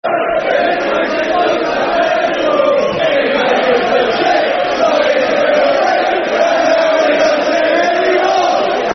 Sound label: Football Chants (Soccer Songs); He's Only A Little Scummer; Portsmouth Pfc